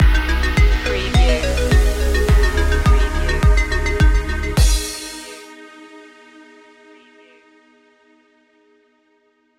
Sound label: sunfruits stinger